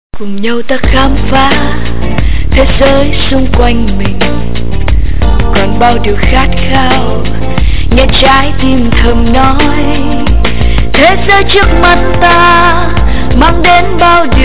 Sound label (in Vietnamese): quang cao; nescafe